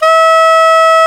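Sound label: Soft Soprano G#3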